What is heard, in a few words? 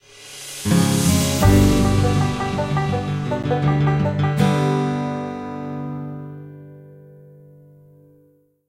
Cabin Stinger